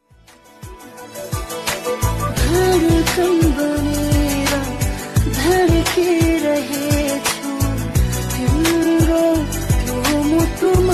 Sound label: nepali ringtone